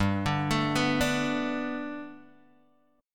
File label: G Major Add 9th Guitar Open G, chord